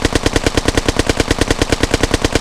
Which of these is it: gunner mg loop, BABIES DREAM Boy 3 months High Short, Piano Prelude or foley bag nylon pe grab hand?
gunner mg loop